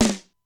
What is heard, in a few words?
Simmons SDS-9; drum machine; Factory D5